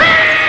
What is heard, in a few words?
uughh; Amiga Soundtracker Sample Packs